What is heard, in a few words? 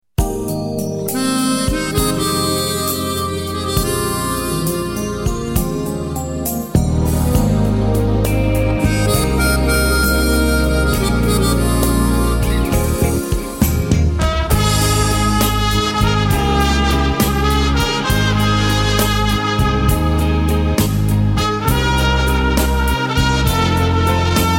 loving trumpet